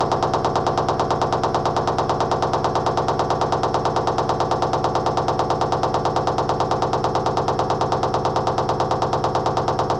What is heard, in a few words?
Thumping, washing machine; Wash Spin Cycle